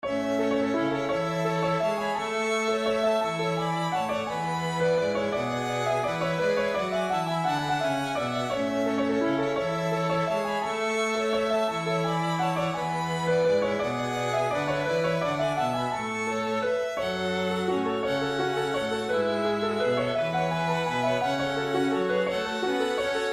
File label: waltz clog full score 170bpm